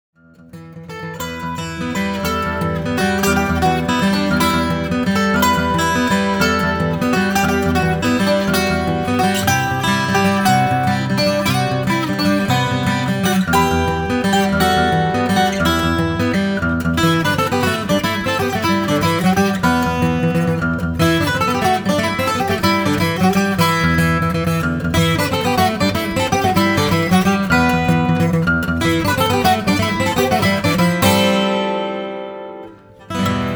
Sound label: stomp